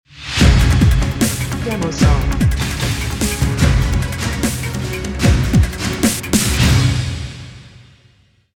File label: Stinger